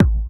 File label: THROAT; Spectrasonics; Metamorphosis; THROATERS